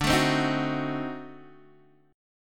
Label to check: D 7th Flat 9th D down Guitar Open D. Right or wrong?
Right